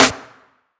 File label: sparkle snare sample c key